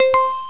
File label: start